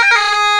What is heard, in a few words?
PIPE CHAN; World Combo